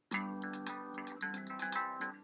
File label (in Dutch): Muziekfragment Oldies